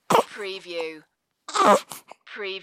Baby Fussing

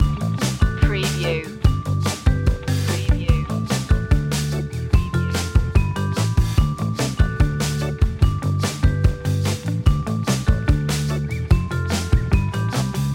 driving fast groove loop

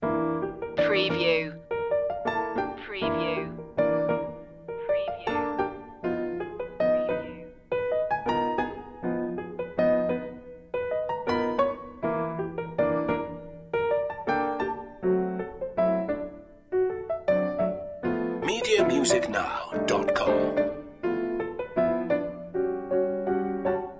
jazz up loop

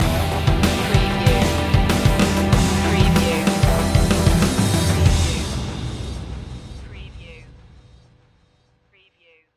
stinger

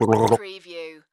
Gargle Water Short

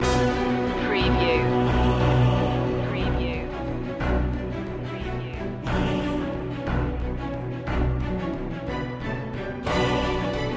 no perc

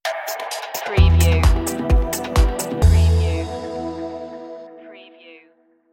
airscape stinger

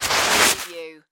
Snowboard Slide Stop

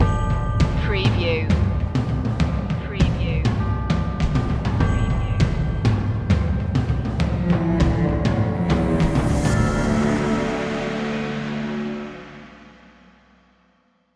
ambient army stinger

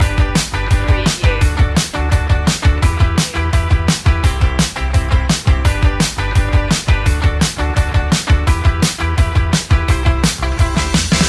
indie people loop

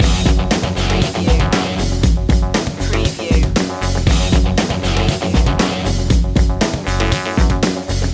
funk loop

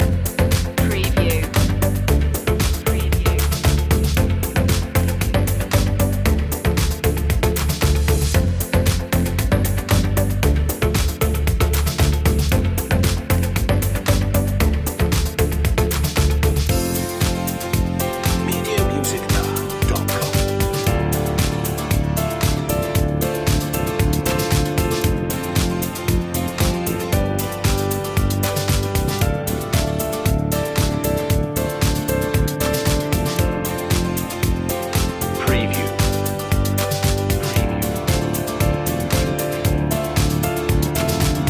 bubbles loop long; media music now

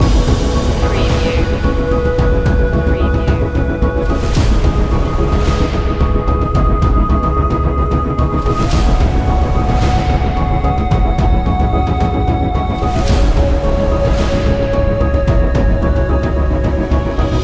black banner loop

